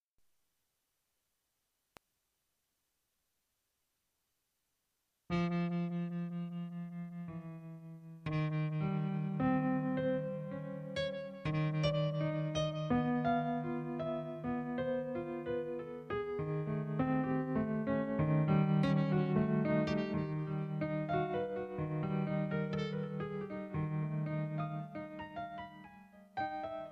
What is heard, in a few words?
juno g internal patch grand